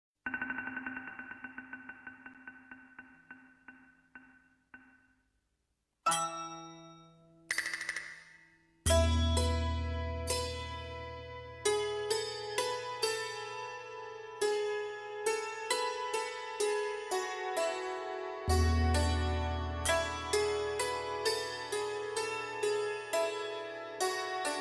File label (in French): Musique